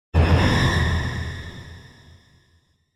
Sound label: Symphonic Hit Strings And Drum Murder Finale - Semitone; Hollywood Edge; sound designer's toolkit v2